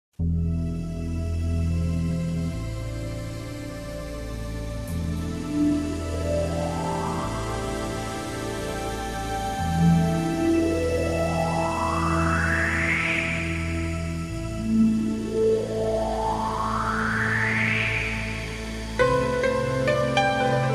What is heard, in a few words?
ambient with nature